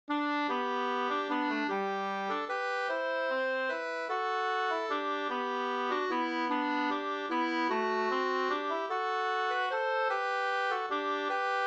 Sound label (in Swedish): vals Irsk vals; Fanny Powers